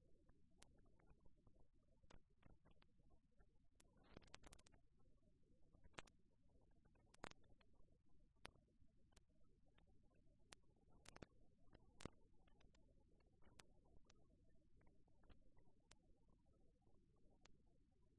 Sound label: riverside; Kyoto U; Ashiu